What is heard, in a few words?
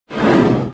crate slide